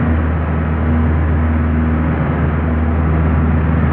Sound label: distance airplane heavy idle; Hearts of Iron IV Death or Dishonor